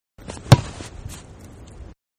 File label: Football Punt; Sports; Manhattan Production Music